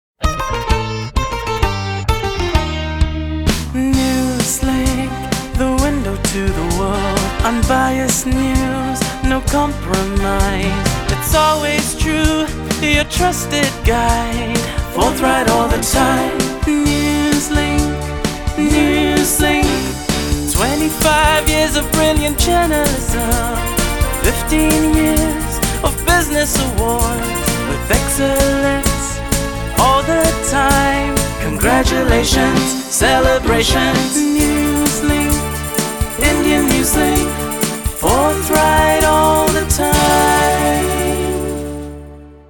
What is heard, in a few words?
Jingle; Indian Newslink